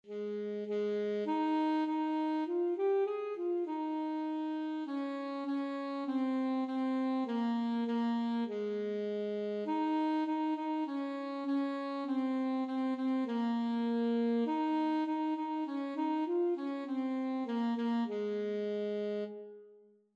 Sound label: ASAX, saxophone